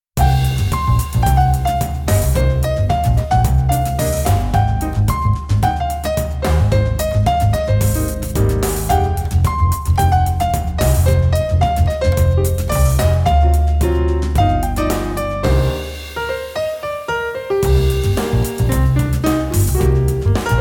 jazz lounge version

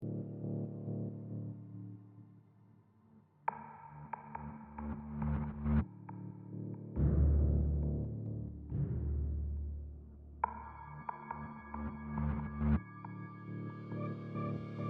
tension one shots

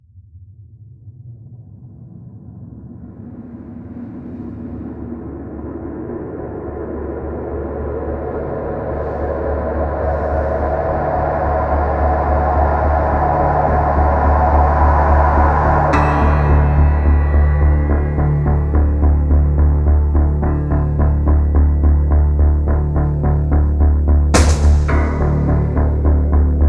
noise instrumental

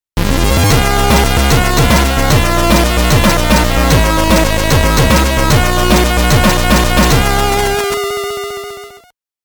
game clear chipped

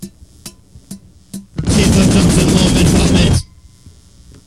cheaper drums

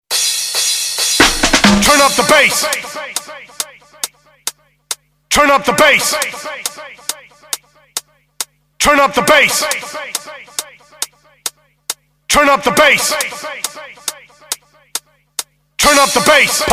acapella

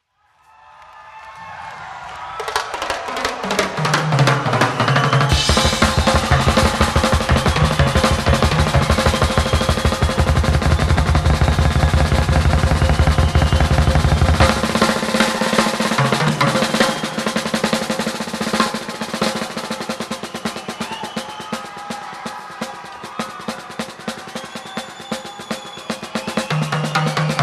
drum solo

